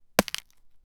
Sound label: picking strawberry from b; garden